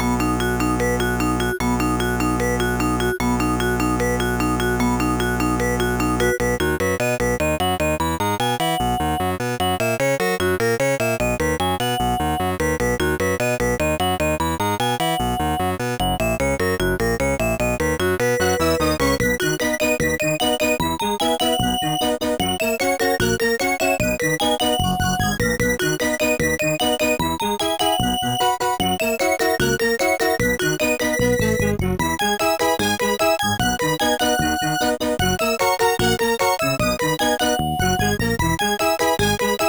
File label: music box; Deluxe Music Construction Set